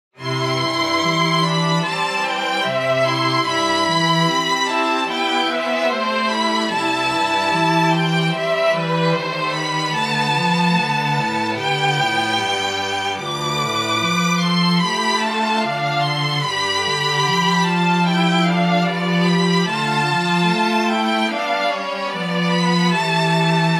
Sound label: QUARTET